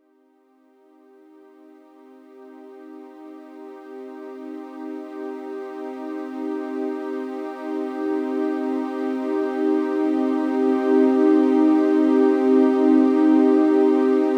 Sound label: Filtered Sweep, Trance; Sonic Foundry (Sony Creative Software); Trance NRG VOL-2